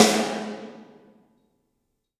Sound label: Snare Shard